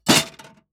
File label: metal clutter; Sounds Great; Metal Elements